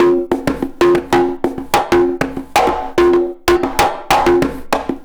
Perc 95; musicradar

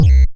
Kick, Drums; Bitkits

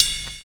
BUZZ SNAP; Univers Sons - Kitch 70s

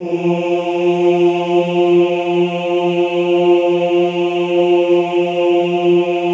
OOH F2, Classical Choir; Best Service; Extended Classical Choir; OOH MIX

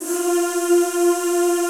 ZZZ F3, Classical Choir; Best Service; Extended Classical Choir

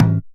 JUNJUNBA HIT; Best Service; World Colours

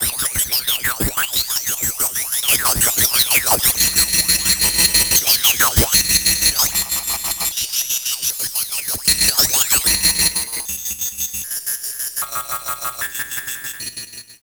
INSECT, Special FX Twisted; USB Soundscan; AKAI

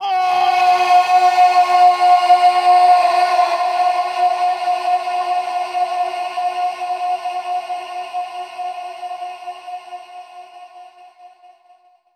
Cavernous Shout; Total Drum&Bass

